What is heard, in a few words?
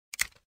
click